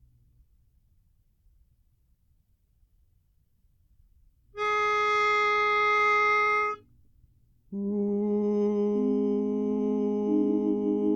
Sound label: Jingle Bells Bass; Christmas